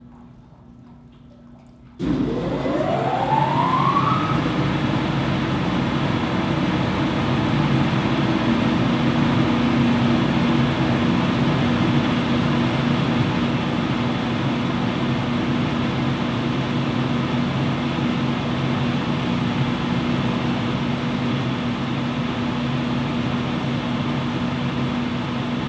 Large Industrial Machine Starting Up And Running

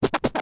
Ketchup Step

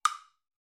Wood Block Hit

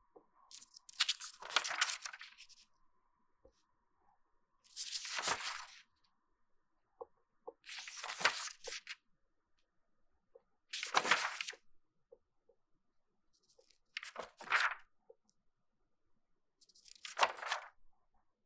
Page Flips

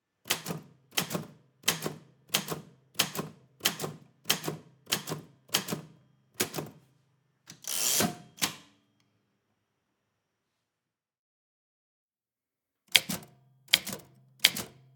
Typewriter Type

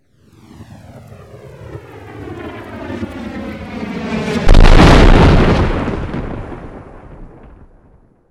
Missile Strike; Digifishmusic

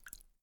Water Dip

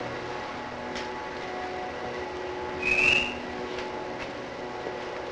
Train

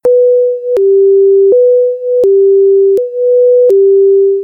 Ambulance Siren

